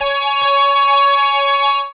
synth glide, dance synth; Sound & Vision - Gigapack I CD 2 (Roland)

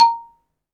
XYLOPHONE, Percussion; ILIO

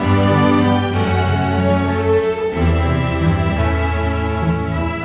Strings; Sonic Foundry (Sony Creative Software); Orchestral Series VOL-4; Rock and Pop; Rock-Pop 04

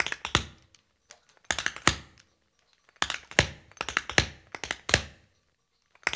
TAP, African Tap; Spectrasonics; Supreme Beats

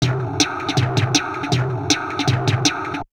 DIST, Drum Loops Crazy Processed; USB Soundscan vol.07; AKAI

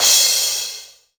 Oberheim DX crash

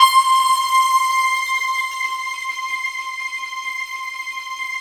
GOA C 5, Korg; Back In Time Records; Korg Universe VOL-1

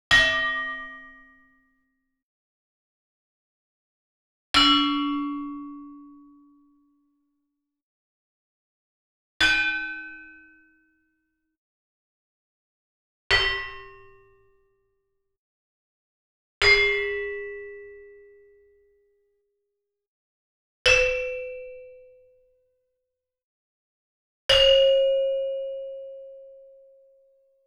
Gamelan; Propeller Island - Complete Gamelan

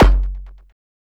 combidrums, techno; single-drums

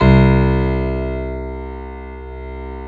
PIA PRO, Roland

